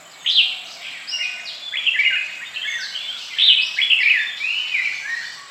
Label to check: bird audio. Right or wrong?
Right